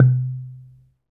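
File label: percussion, log drums, African; Roland LCDP11 Africa VOL-1; Wood & Metal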